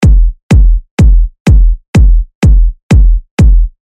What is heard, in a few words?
Beat Dissected Deep Tech House